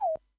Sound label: stiletto; syreen